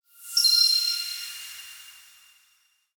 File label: whiteout